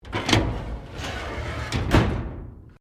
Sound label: Drawer Metal Filing Cabinet Close; Various domestic drawers; Household